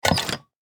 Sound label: Office hole punch paper vintage